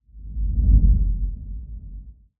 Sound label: woosh